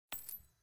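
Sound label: environment building sold coin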